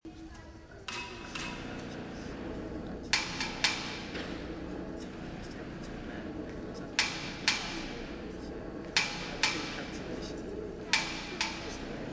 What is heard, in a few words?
building sounds